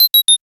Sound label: NFC Failure; Nothing Phone (1)